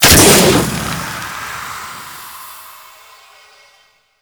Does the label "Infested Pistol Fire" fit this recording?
Yes